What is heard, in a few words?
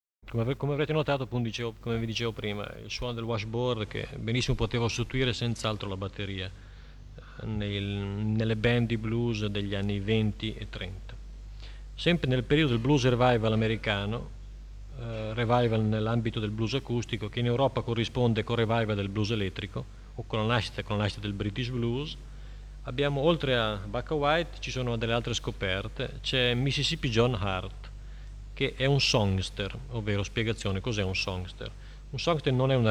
Blues; Blues Workshop